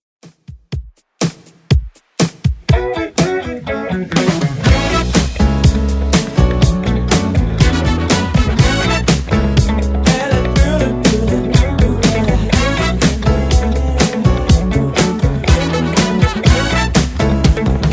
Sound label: 70s Redrum 122bpm